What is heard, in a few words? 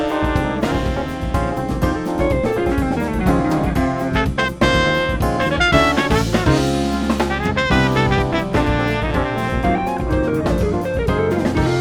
Drum Hits Before M